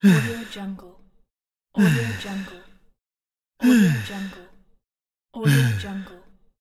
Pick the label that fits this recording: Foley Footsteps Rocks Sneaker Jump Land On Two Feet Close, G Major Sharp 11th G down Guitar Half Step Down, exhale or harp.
exhale